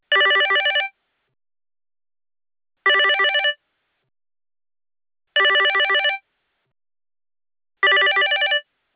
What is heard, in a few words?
Ring shifter 4x